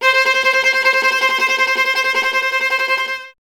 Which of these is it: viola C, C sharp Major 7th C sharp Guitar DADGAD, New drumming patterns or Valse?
viola C